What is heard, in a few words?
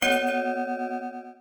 Transition Bell Hit; Production Element Title Transition